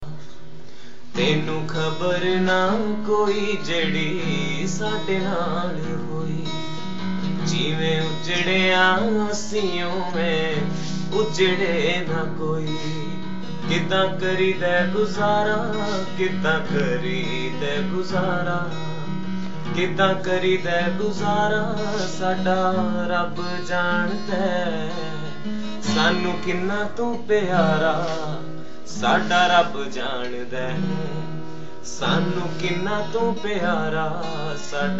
singing sad with guitar

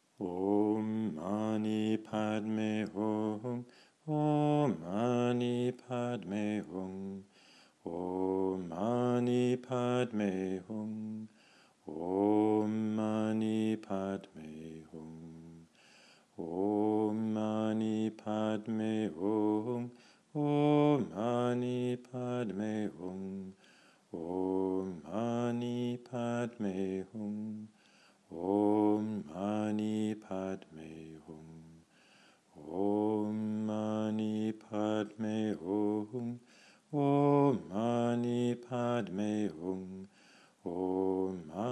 om mani padme hum

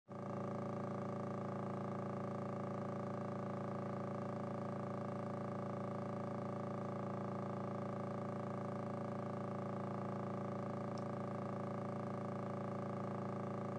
radiator

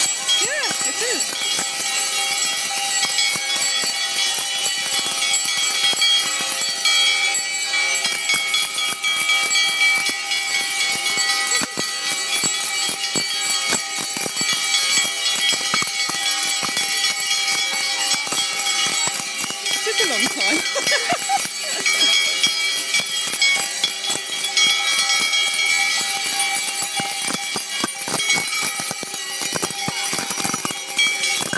ringing the bells

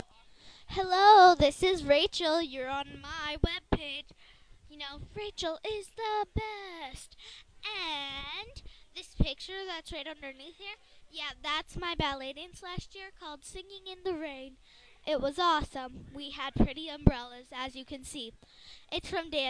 singing in the rain

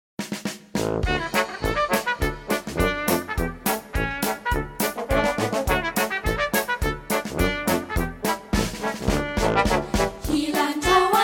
Picnic Heel & Toe Dance

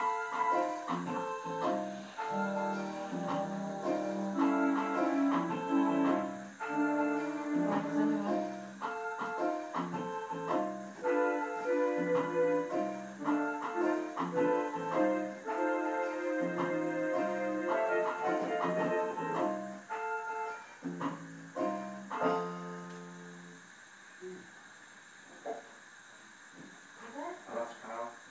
parents are messing around with the keyboard

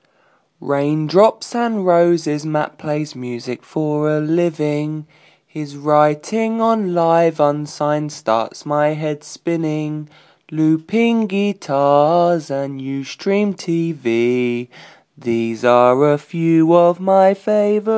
song

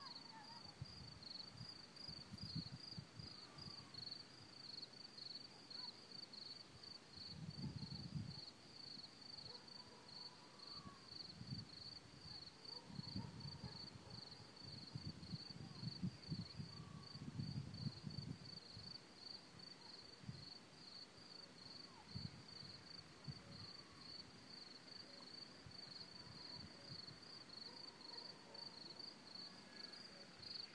evening sounds

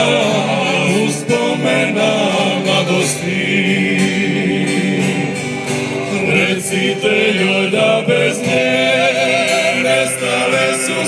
Croatian music klapa

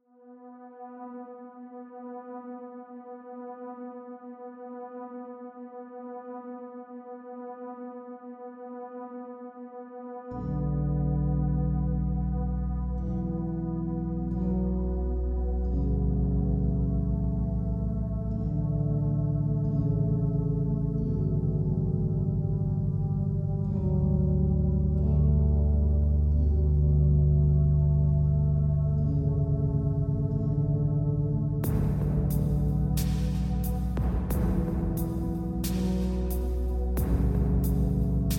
ambient electronica

